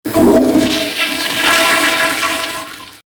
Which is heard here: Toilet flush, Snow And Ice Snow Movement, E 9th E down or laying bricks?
Toilet flush